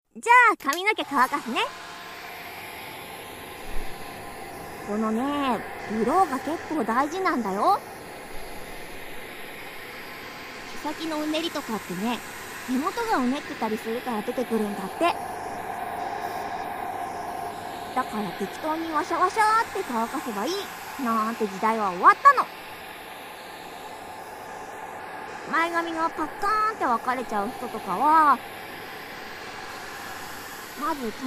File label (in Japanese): ドライヤー; 立体風編集版